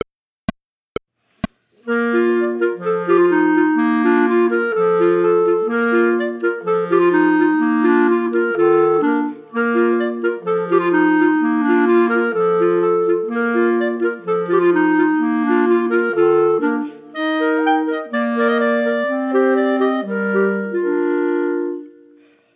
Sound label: Bohemian Polka minus 1st part; Ensemble Classics Book 1